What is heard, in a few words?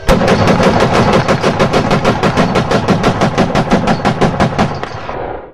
b17 ball turret firing single